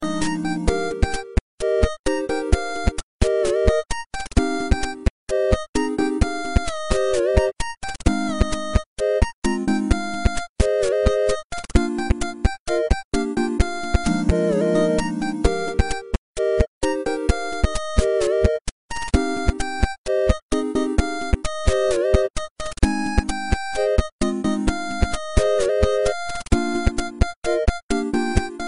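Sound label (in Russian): milaya melodiya, sweet melody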